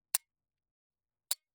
M1911A1, Handling, Dry Fire, semi-automatic pistol; Colt; MKH416; Pole Position